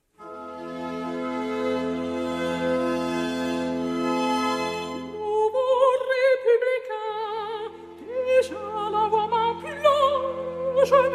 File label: Recitativo; Nouveaux Republicains